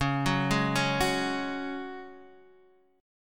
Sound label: C sharp Suspended 4th C sharp, down, Guitar, Half Step Down